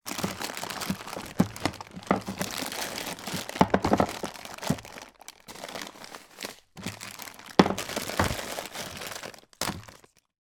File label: household larder food cupboard rummage